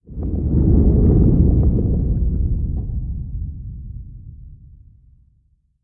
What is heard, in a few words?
earthquake rumble; sub earthquake rumble; Modern Warfare